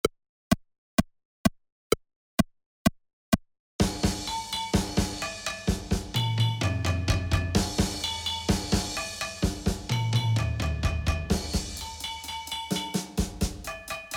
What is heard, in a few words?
Mechanical Monsters Percussion Mix